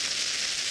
Bomb Fuse Loop

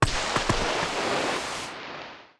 Wolf Dig; Wolf Link

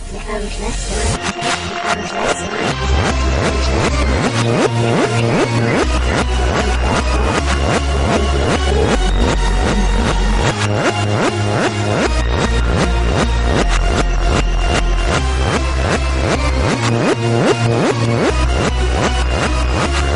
Jumpstyle Remix

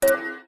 Portals Highlight

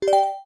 Save Menu Slot Select

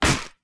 Slingshot Hit

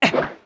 Backflip; Link

